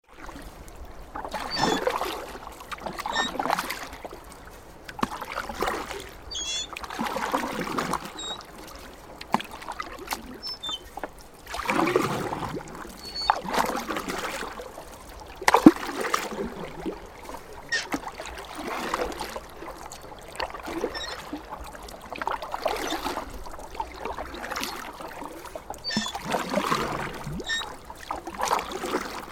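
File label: rowboat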